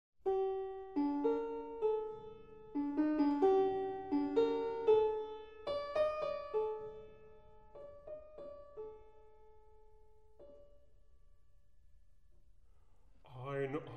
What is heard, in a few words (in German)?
Alphorn